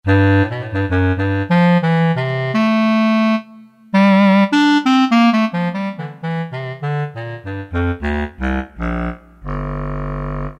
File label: BASS CL; Orchestral Winds; Roland SN-U110-06